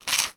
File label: human eat crunch toast; sound effects one